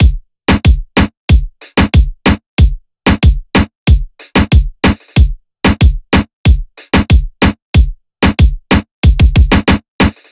Drum Loop 93 BPM, Hip Hop; Drum Loops; Manana; Cymatics

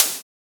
Snare; Clever

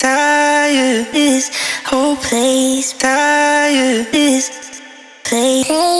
Vocal Chop - 160 BPM A# Maj; Cymatics; Euphoria Vocal Sample Pack

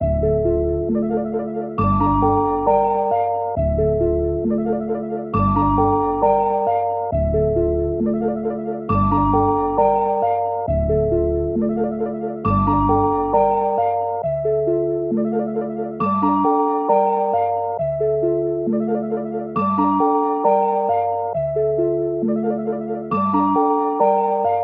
135 BPM D Min, Melody; Axis; Cymatics; 2022 Melody USB Expansion

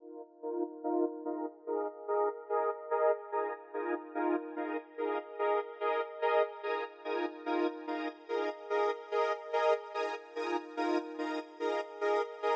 Tremolo Chords, 145 BPM D# Min; Stems; Cymatics; 2022 Melody Collection (BETA); Wavy; WTF

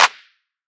Clap; Cymatics; GHOST (Beta Pack); Drum Kit

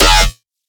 Riddim Bass Hit D# Cry; One Shots; Ghosthack; Riddim Essentials 2020

